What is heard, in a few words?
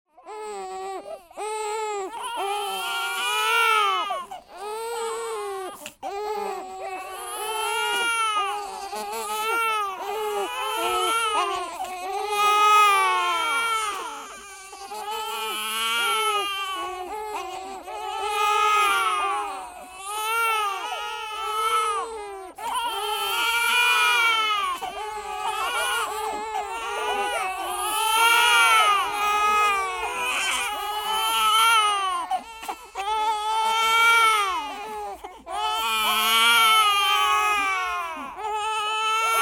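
babies in maternity hospital all at once; Плач новорожденного ребенка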